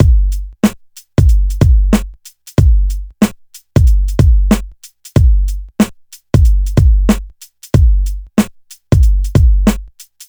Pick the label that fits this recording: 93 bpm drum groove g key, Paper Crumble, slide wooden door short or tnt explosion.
93 bpm drum groove g key